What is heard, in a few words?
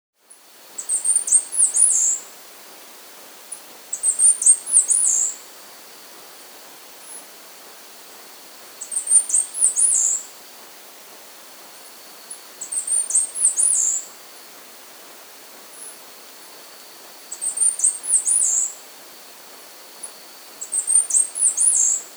Chestnut-Capped Brush-Finch, Nature's Songs, S. America